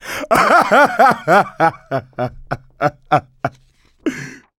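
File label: laughter, male; survivor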